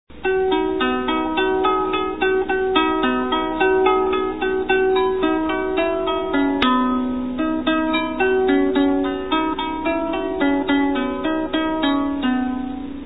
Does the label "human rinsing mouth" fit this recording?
No